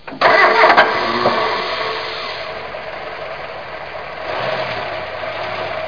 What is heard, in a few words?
car starting; Epic Interactive Encyclopedia